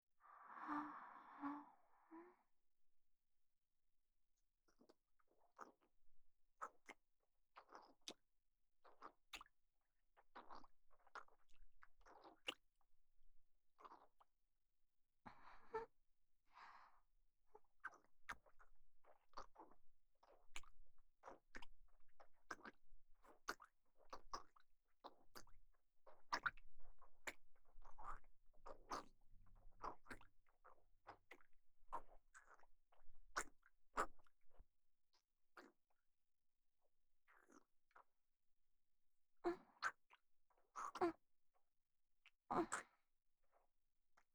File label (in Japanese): フェラチオ; SEなし